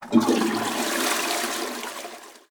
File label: Panel Toilet Flush; Among Us